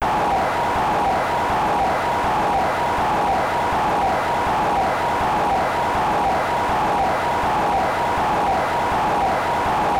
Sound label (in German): Publikum; punch-out